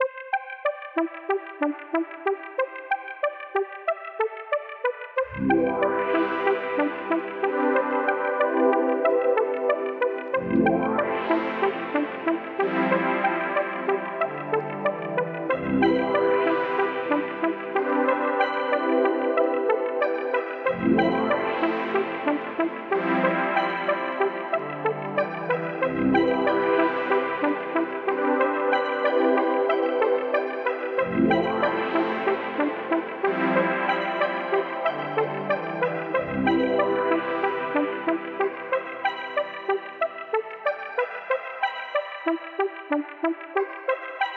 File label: Music Ethereal